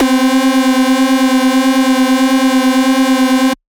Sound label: Dirty Dutch Lead C3